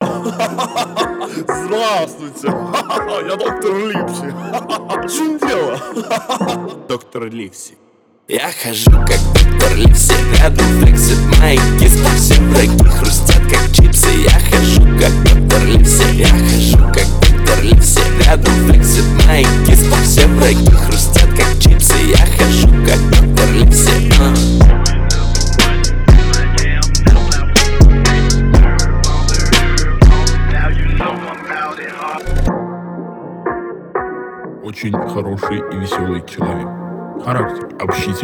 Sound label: phonk